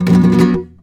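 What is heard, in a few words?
RASGAND C minor, FLAMENCO Guitars; Spectrasonics; Hans Zimmer Guitars VOL-2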